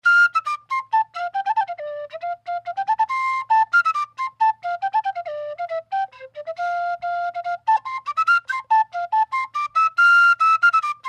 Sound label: Penny Whistle, clarke penny whistle